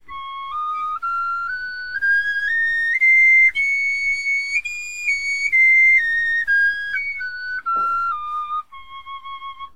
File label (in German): tonleiter